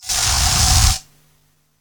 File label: Spray